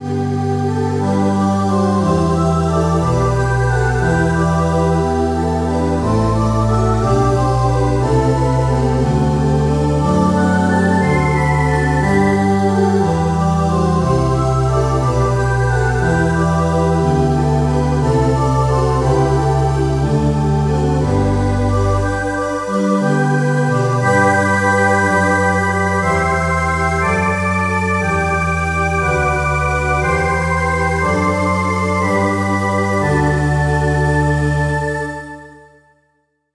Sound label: synth voice